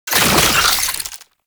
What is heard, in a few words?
Oraxia Brood Spider Spawn; Warframe